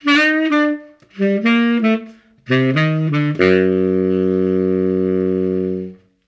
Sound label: Disco Drama; simpsons hit run; ps2